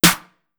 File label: Snare, drums; Ableton Live 12 Lite Core Library